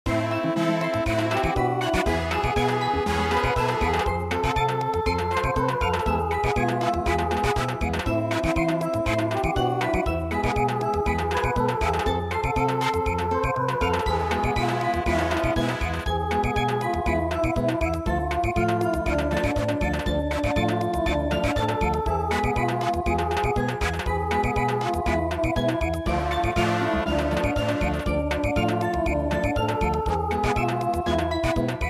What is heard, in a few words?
Samba, midi; Hava Nagilah